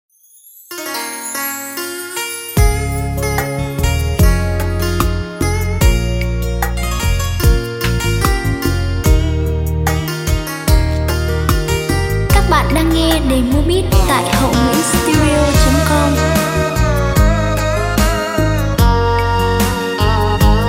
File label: beat, playback